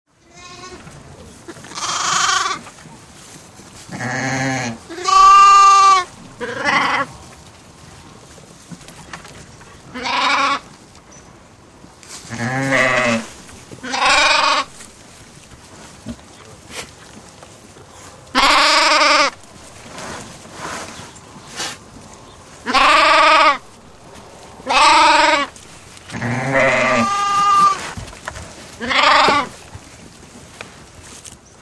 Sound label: Sheep Bleats Group